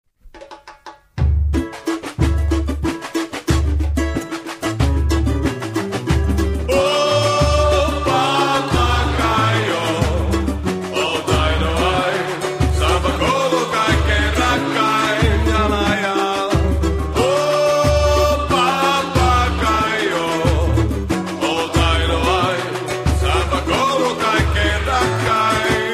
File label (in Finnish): hymni, bateria